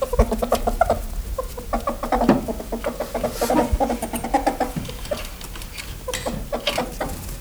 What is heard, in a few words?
ferret